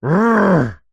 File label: human male grumpy angry frustrated growl; zapsplat